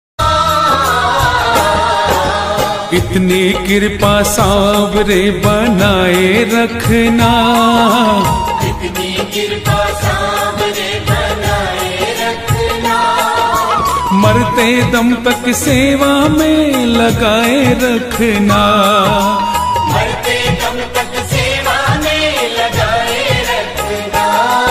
Bhakti Ringtone Krishna Ringtone

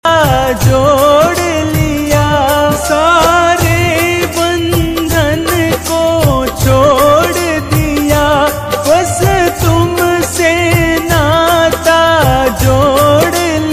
Khatu Shyam Bhajan

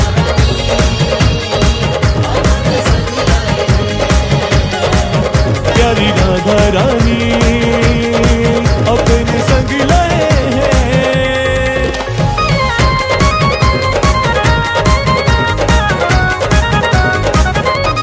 Krishna bhajan

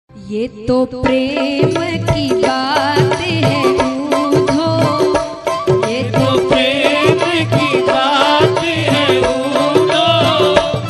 Bhajan

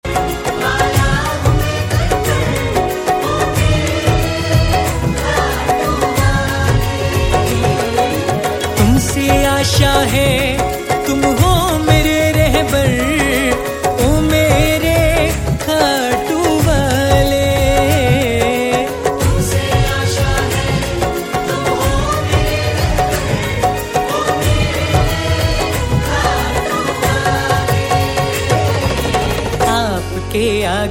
Ringtone, Shyam Bhajan; Kahtu Wale

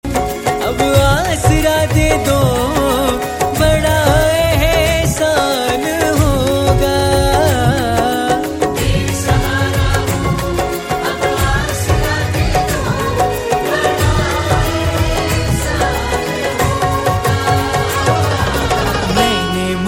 Shyam Bhajan Ringtone; Saanware Sarkar

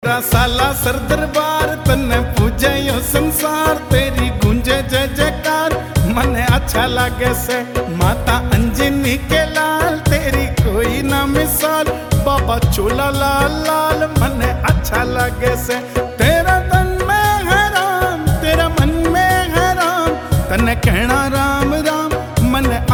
Balaji Bhajan; Sonotek

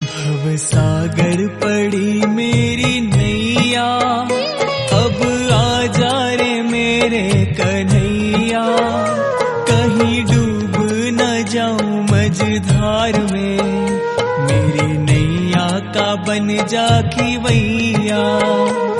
Lord Krishna Bhajan Hindi Ringtone

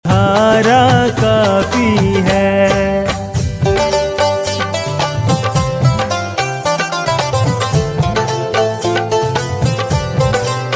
Khatu Shyam Bhajan

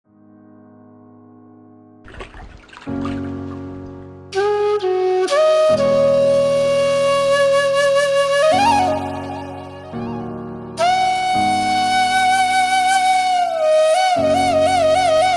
Flute; Radha

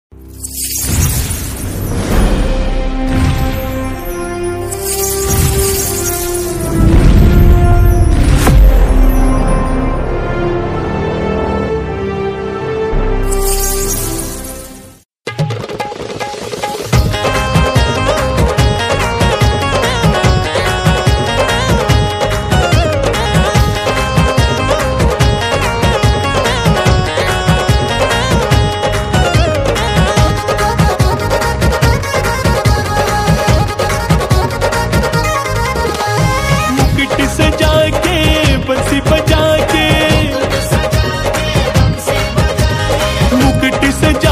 Instumental Krishna bhajan

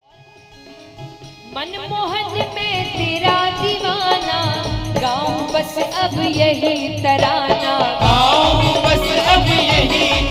Bhajan

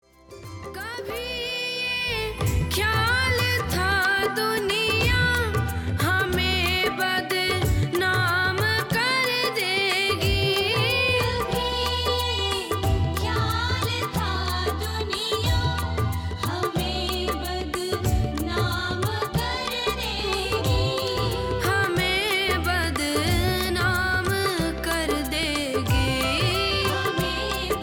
Trending Bhajan

